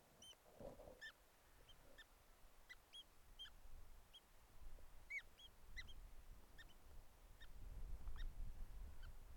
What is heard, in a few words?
black storm petrel